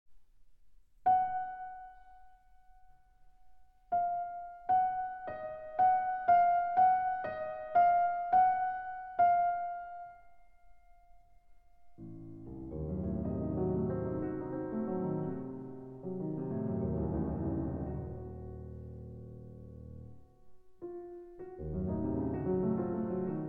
Klavierstücke in E-Flat Minor, Intermezzo. Andante, largo e mesto